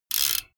bmx bike crank freewheel chopped short

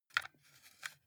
console controller xbox joystick move soft

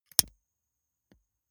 lighter electric switch flame on gas

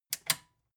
metal button click power off fast

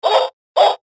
digital toy dog

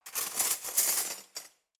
cutlery knives drawer handle

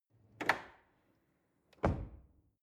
drawer cutlery close draw short

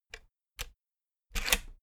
rotary control plastic rattle turn

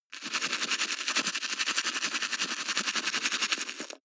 teeth brush wet open front fast

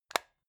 plastic remote battery compartment close

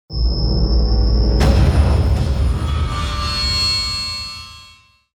monster hit

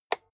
rotary control single turn hard

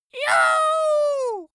dwarf cheer "juhu"

